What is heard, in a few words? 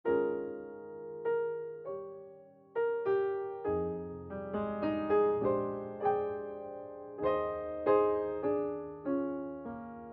Tutti, Gospel; Silent night V2